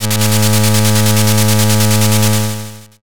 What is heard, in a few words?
slot spin